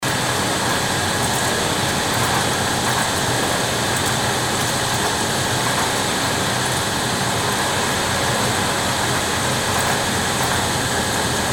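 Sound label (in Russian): звук производства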